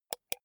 computer mouse wheel click

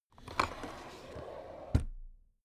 drawer cabinet stuff close soft

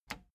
plastic button latch loose push disable

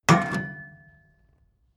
metal impact bucket solid

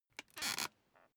office chair creak lean back short

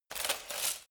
light walk fast single

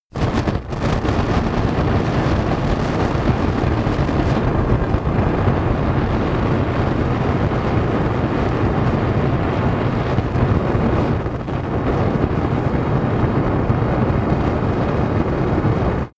fire burst

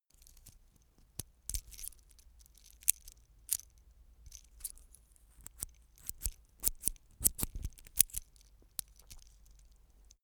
metal watchstrap rattle

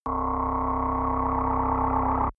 machine room

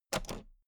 toolbox latch open heavy

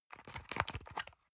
paper box small handle medium

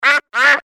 duck

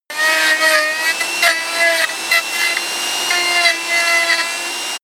sander on load unsteady

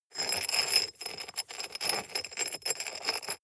scrape stone shard medium

